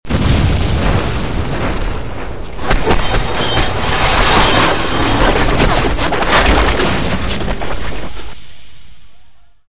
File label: apach landcrash